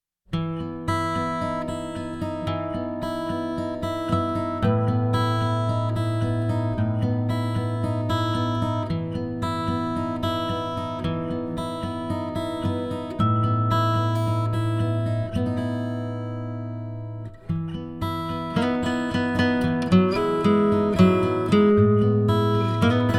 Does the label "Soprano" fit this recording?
No